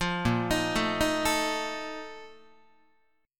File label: A sharp Major 7th Suspended 4th, Guitar; arpeggiated